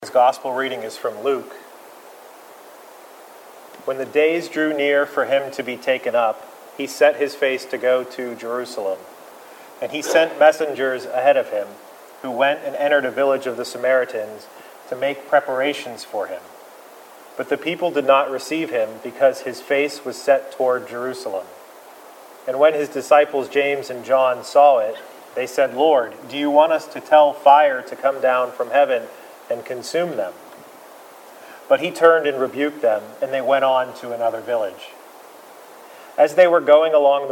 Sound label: Gospel